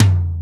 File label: LO TOM DRY, Ensoniq ZR-76, Drum Machines; 300 Drum Machines